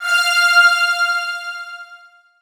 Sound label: Riddim Synth Hit F; One Shots; Ghosthack; Riddim Essentials 2020